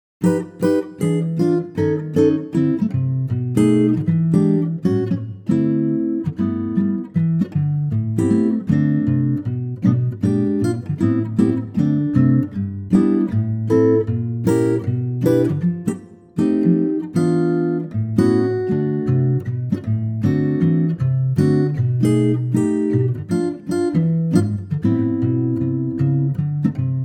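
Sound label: G acoustic guitar; key - G - vocal range - F# to B; Superb acoustic guitar arrangement, at a great tempo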